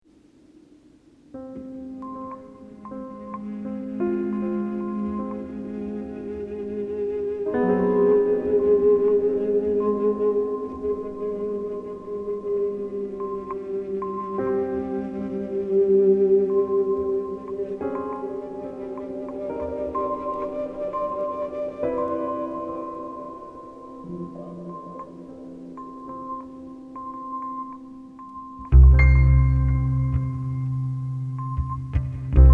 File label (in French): Piano cello lent amb